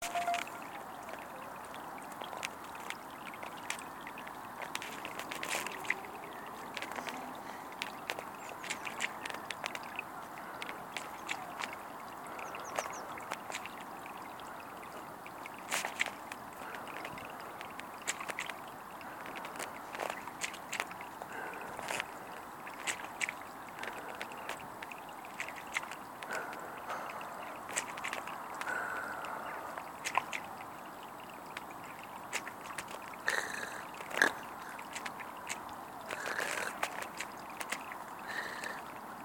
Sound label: improv with water; reddish vale